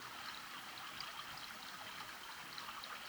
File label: Waterway B Light